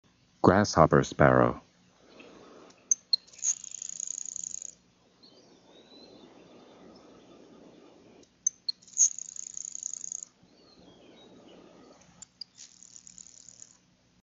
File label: grasshopper sparrow